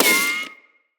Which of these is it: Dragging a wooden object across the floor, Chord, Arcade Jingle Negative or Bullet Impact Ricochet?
Bullet Impact Ricochet